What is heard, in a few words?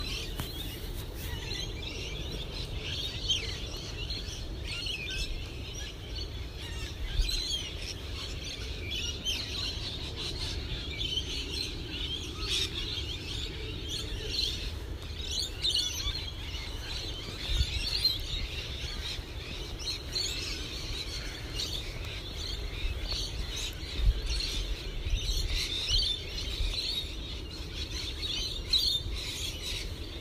Morning Birds Coffs Harbour